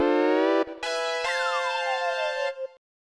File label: Stage Clear Fanfare; Dr. Luigi